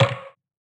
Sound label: Wood Rough Low, One Shots Drums